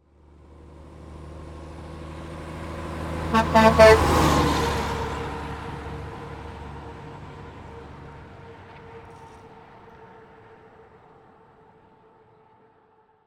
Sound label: Semi Truck Horn; Large Vehicles; Blastwave